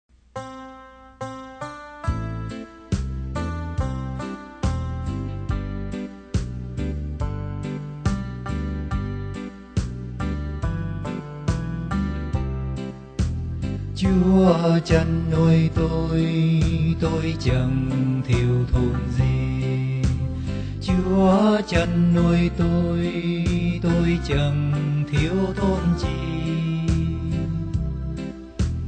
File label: BASS